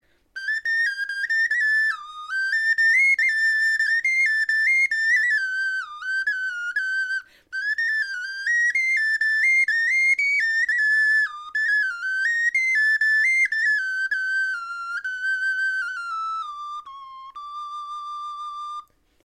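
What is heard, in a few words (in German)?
melodie, Knochenflöten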